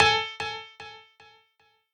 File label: piano sabi